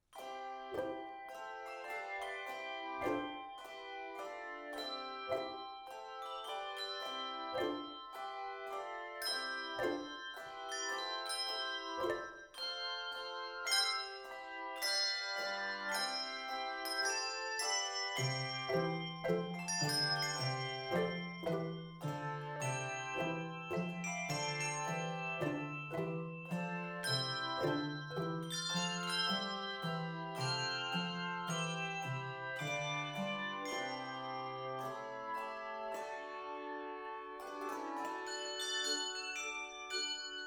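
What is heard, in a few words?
Handbells 3-5 Octave; Lorenz Corporation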